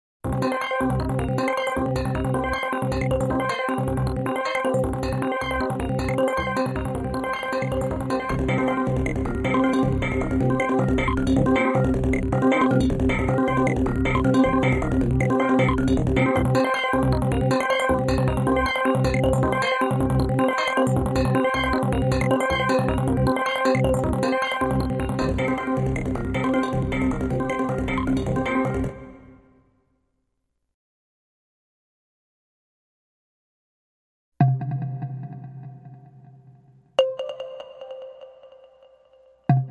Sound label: Rhythm